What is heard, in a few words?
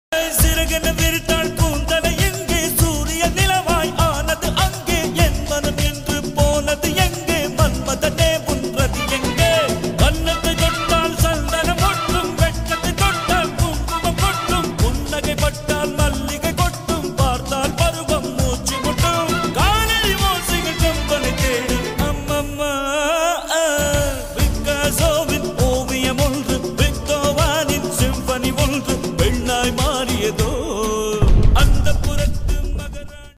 song love mix, Tamil love